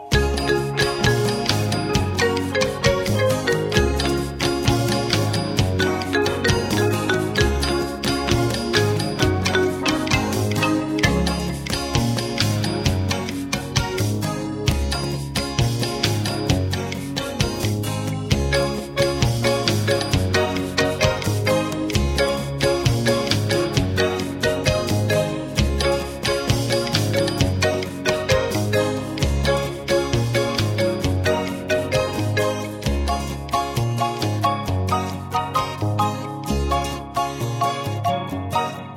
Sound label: Calypso Dance